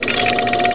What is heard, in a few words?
scan